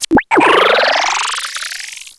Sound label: Koopa Clown Car Small; Bowser Jr